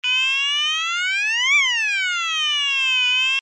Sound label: alarm tone, sounder alarm tone; sounder beacon